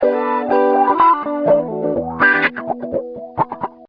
sweet, guitars

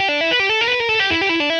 tapped, guitars; Loopasonic

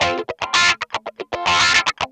clean funk, guitars; Loopasonic

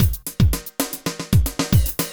linear 113, drums; Loopasonic

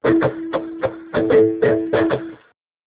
percussive, guitars

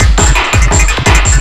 junglist, drums; Loopasonic

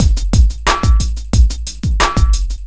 solid 90, drums; Loopasonic

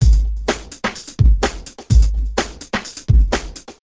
funky breakbeat

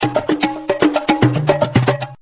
ethnic 113, drums

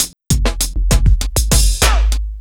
break, drums; Loopasonic

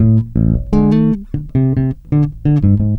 funk, bass; Loopasonic

